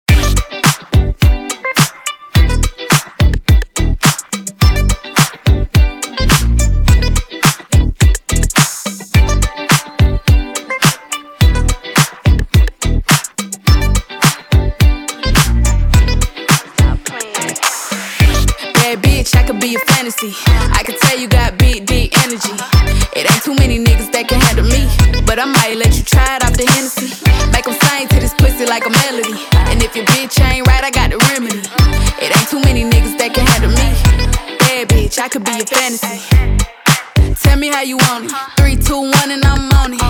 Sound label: Extended; Redrum